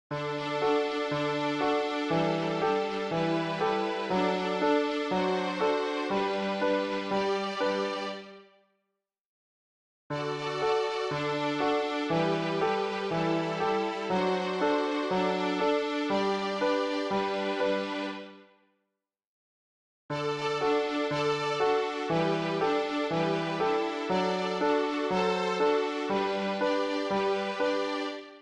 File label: Pizz. and Bows